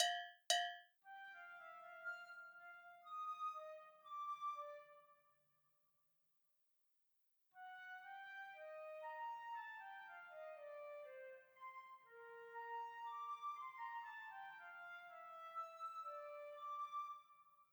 Flute Classical

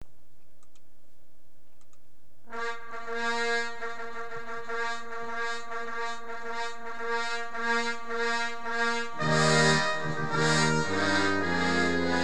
accomp; Triumphal March; Grade 10 Class Exam Solos; Xtras Classical Favorites

